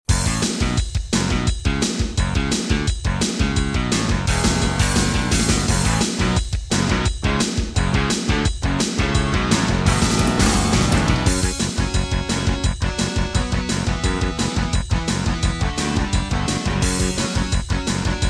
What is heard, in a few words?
Intro, Gallant GMW-1000; Duke Nukem 3D